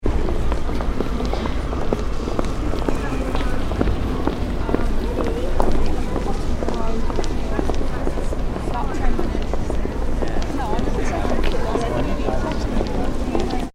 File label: ambience urban town pedestrians walk talk; Pedestrians Walking And Talking; Town Pedestrian Street Ambience; McKinney Sound